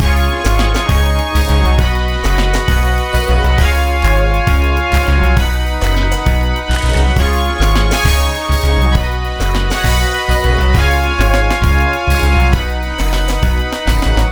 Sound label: CIRCUS